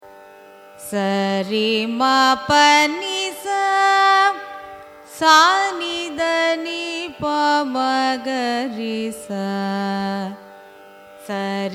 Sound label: raga kapi arohanam avarohanam; carnatic music